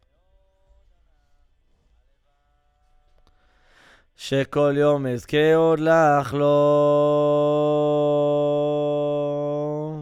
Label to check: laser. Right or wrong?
Wrong